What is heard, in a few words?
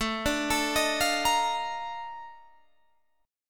A sharp Major 11th A